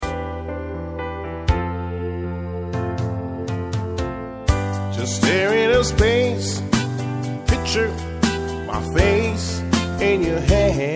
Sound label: the blues, steeldrumband